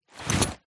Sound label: Backpack, Handling, Pick up; PMSFX